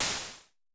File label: Crash Misty, Vermona Mono15 Drums